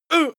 male groan pain short soft; menschen; schreie

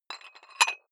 dumbbell put on rattling big weight; sport freizeit; fitnessstudio

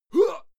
male fight attack hit; soundpool

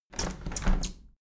window handle plastic squeak close tilt heavy; objekte; fenster

